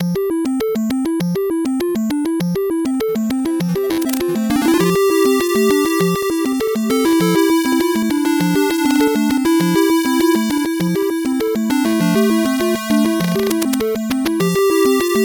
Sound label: 8bit bgm fast